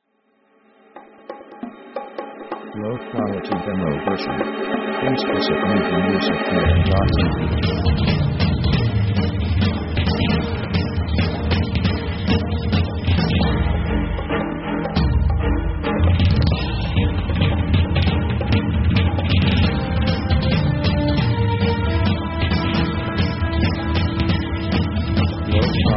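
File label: orchestra power action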